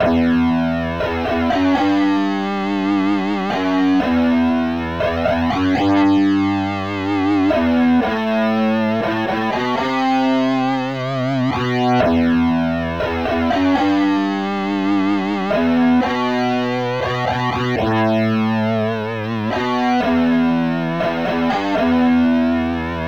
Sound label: Overdrive